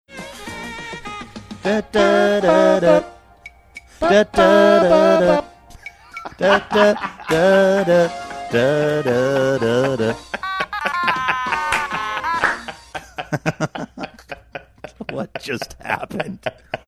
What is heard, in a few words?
both singing otamatone saying what just happened